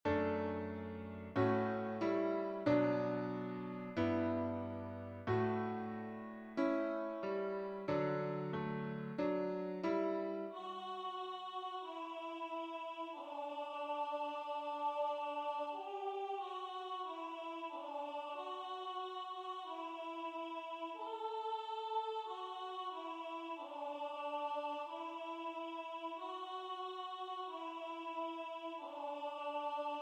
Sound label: lead me lord B; wesley